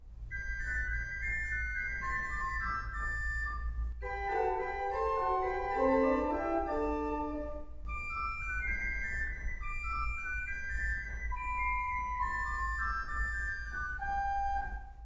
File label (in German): Scarlatti Sonate K338 Ausschnitt